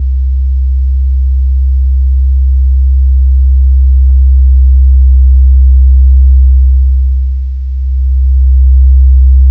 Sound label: SUBTERRANIA, Bass; Distored Reality 1&2